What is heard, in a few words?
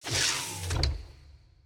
Door Close; Environmental